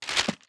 Letters Done

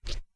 Boot Lift

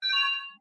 Lantern Low

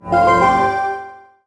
Appear; Midna

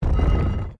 Save Menu Appear

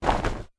Item Out Away

